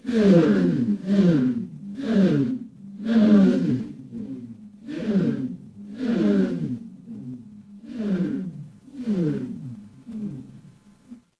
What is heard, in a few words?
crowd gasp